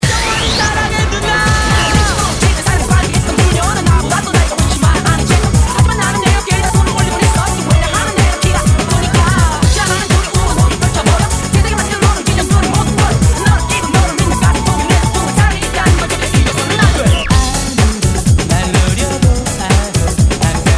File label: Techno Remix